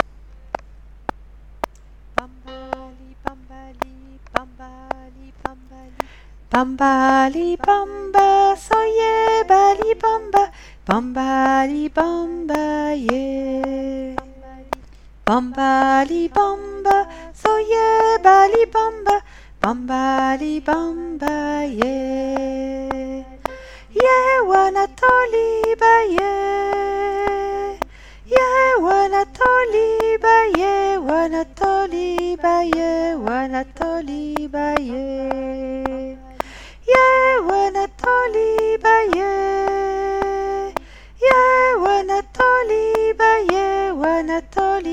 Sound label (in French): Sopranes